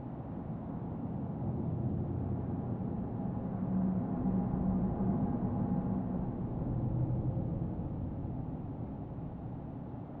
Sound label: crypt ambient; 7 Mages